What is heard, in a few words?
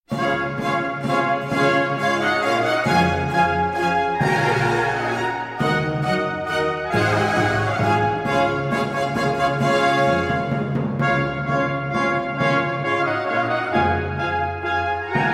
Minuet; Georg Friedrich Handel; Water Music, Suite No.2 in D major